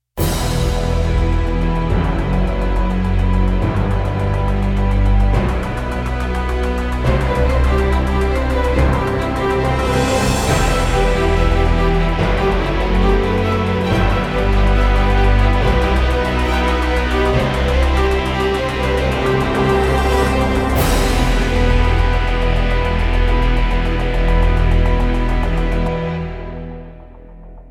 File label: Victory Music; Avarice